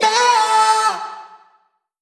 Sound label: Vocal one shot A